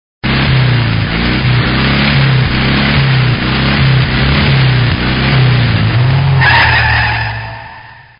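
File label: Motorcycle, vehicles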